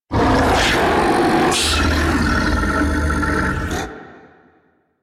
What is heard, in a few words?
Octopede Attack; Warframe